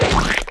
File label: Hungry Goblin Attack, game; Eft